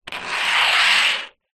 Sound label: bottle top metal slide on table; foley